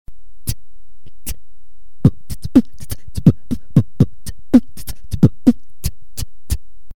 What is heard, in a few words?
hihat